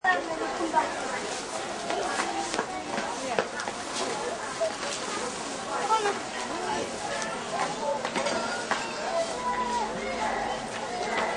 Outdoor Market Moderate, Outdoor market ambience. Moderate pedestrian traffic; Stalls and shoppers. People talking and walking by.; People Sound Effects; Lynne Music